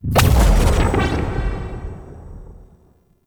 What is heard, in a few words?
Antimatter Portal Close; Gameplay; Warframe; Nineteeen Ninety Nine; Deep Archimedia